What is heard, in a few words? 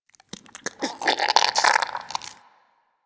Goop Squeeze Close; Ambience; Warframe; Infested Eris